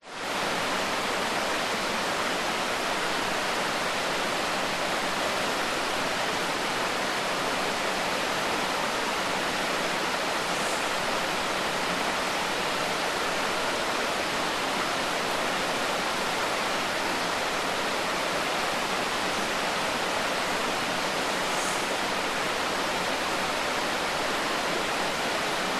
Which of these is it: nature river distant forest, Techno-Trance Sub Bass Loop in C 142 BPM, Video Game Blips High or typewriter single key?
nature river distant forest